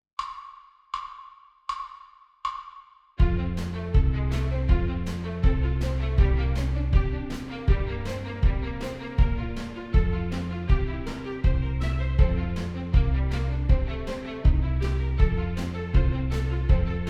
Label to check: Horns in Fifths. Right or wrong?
Right